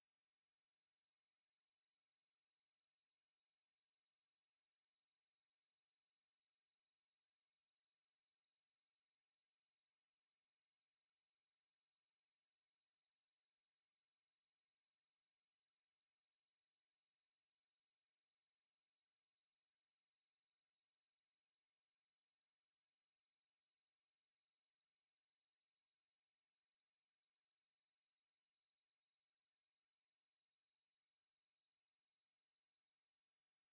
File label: Okanagana canadensis, cicadas songs; US; NY; clean; East of the 100th Meridian